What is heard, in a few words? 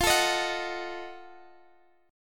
F 7th Flat 9th F down Guitar Drop D